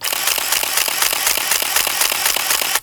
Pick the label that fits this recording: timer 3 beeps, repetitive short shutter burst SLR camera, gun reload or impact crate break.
repetitive short shutter burst SLR camera